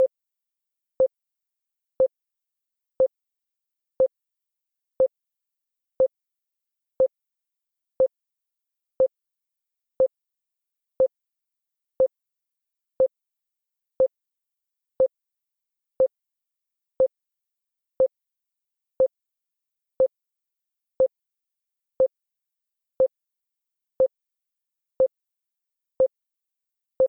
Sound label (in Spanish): sónar 60BPM